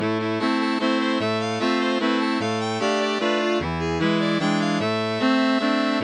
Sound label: Beginning MIDI